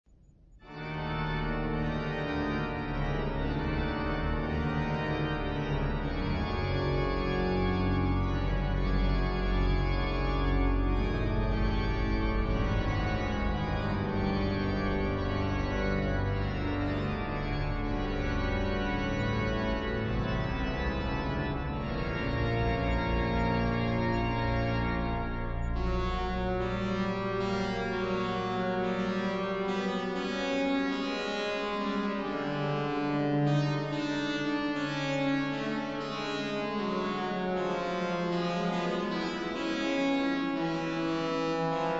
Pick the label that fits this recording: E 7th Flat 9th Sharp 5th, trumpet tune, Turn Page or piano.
trumpet tune